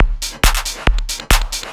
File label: drum 138; Techno; drum-loops